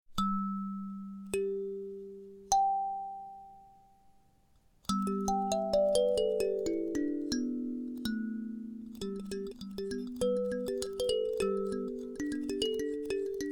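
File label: Pentatonic G, kalimba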